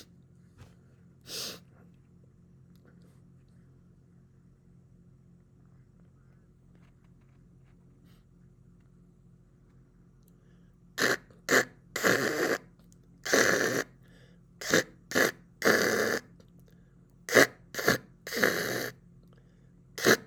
Telephone Vocal

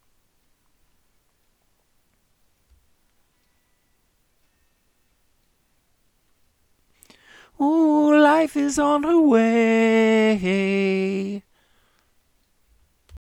Edge Vocal

